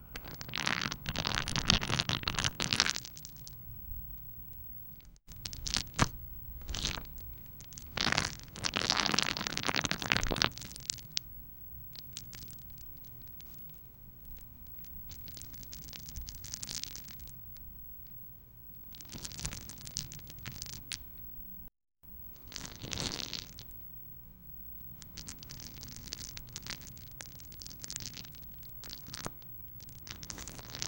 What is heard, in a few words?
a pie splating in sombody